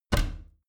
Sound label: bathroom cabinet both close regular; objekte; tueren